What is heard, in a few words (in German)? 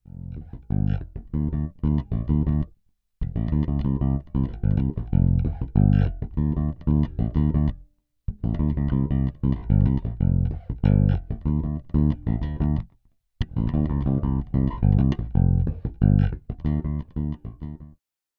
Fingerstyle Tonblende offen